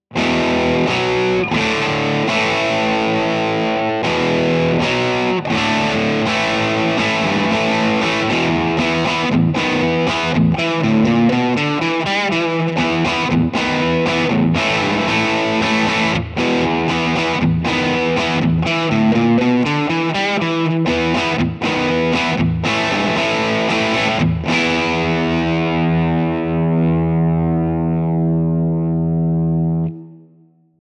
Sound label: rock chords; jensentone